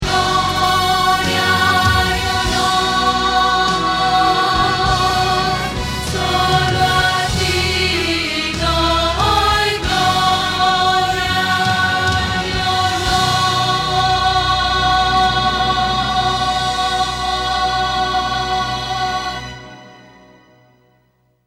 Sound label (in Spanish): Contralto